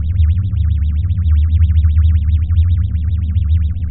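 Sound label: Ambience Sci-fi Energy Loop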